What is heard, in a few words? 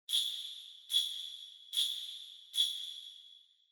Ring Bells Reverb Phrase